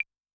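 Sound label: Claves, Perc; Yamaha DTXpress-II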